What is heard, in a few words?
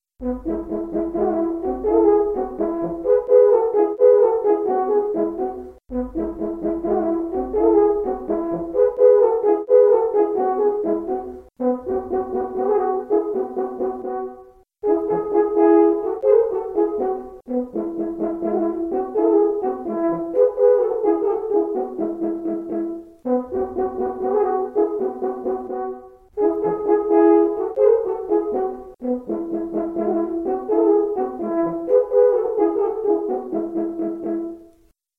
allegro, 2 horns; Stich J.V.: Duet Nr. 9Allegro